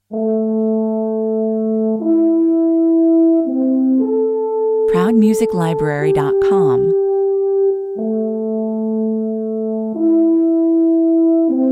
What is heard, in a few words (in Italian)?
battaglia di corno 85 bpm